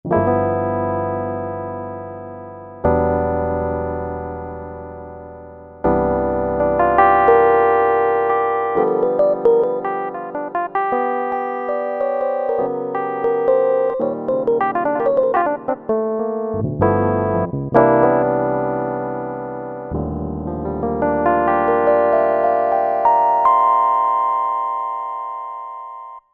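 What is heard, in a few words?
Fender Rhodes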